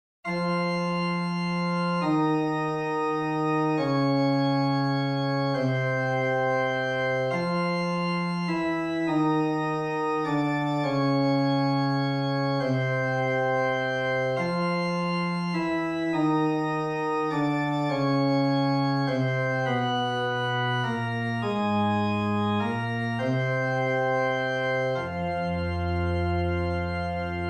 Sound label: lament bass